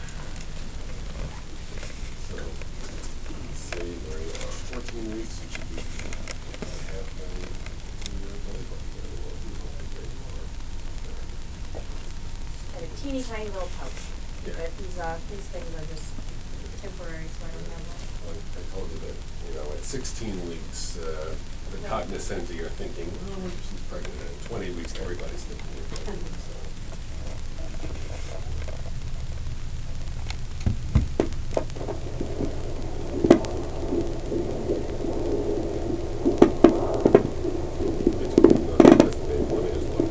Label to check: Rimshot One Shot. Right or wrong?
Wrong